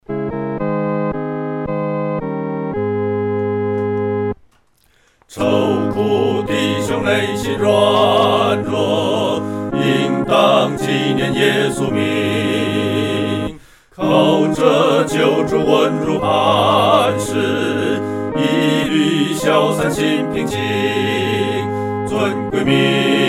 合唱 四声部; 尊贵名

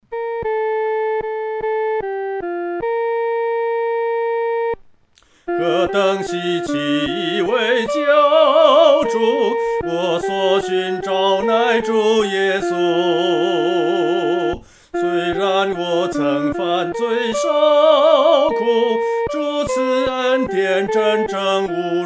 独唱 第一声

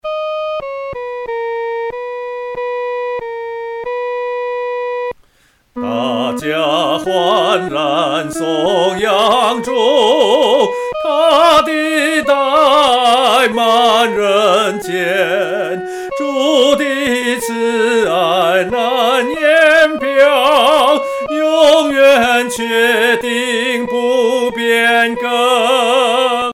独唱（第一声）; 欢然颂主

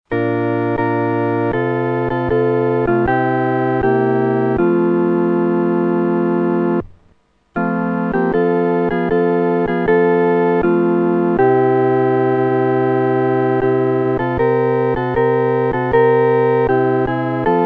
合奏 四声部

